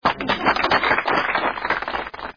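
aplodismenti nebolshoj gruppi lyudej priglushennie, applause of a small group of people, muffled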